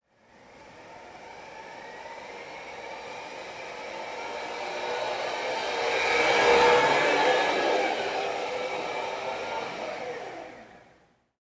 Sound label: Electric Grass Trimmer